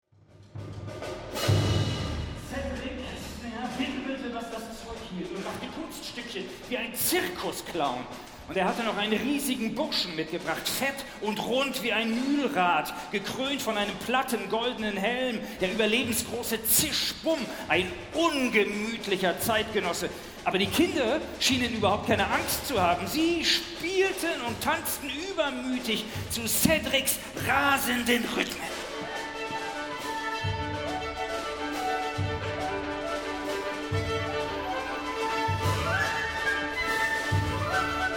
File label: Snare